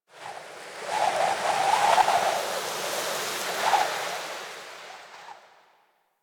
Wind Gust Medium, Ambience Plains; Warframe; Eidolon Plains; One Offs

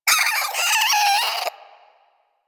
Vocal Death, Hunting Critters; Warframe; Cryptilex

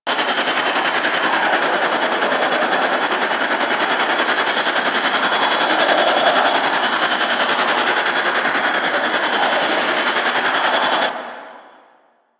Movement Slow Engine Loop; Warframe; Vehicles; Archwing Demolition

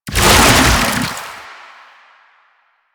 Infested Oni Melee End; Warframe

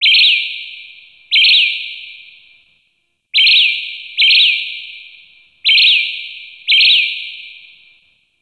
Terrarium Bird Chirp B; Warframe; Ambience; Entrati; IntTerrarium

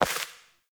Sparrow Death State Impact; Animals

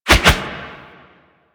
Necramech Melee Swipe; Enemies; Warframe

